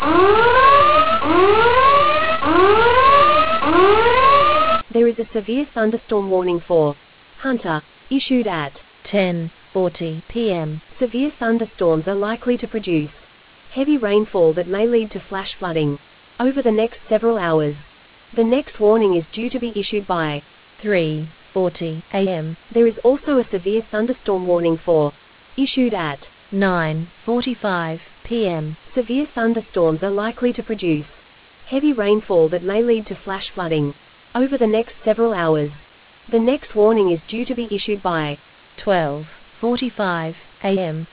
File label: storm audio